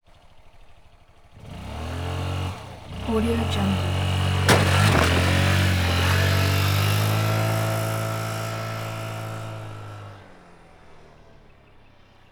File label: honda elite scooter various maneuvers short